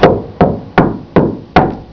KNOCKING; ePhone

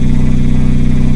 PLANE; DIRECTX

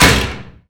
BLOCK; ROCKEM; DIRECTX

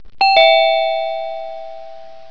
NEW CHAT; ePhone

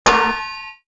miss; FOXBEAR; DIRECTX

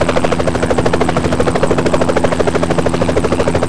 3D Helicopter; Albatron; cmedia; Play3D

